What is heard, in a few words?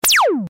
Laser Message